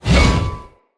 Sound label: Whoosh, Swords; Mockery Sub Boss Stultion; Enemy; Weapons